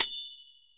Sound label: OPEN KASI, FINGER CYMS; Spectrasonics - Heart of Asia CD2